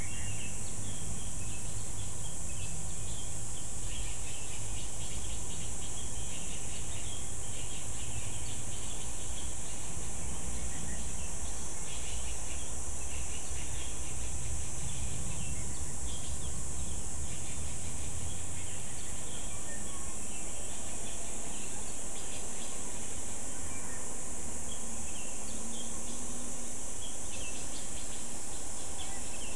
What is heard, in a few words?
mixture of bird and insect